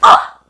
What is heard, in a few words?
bump; quake2; austavin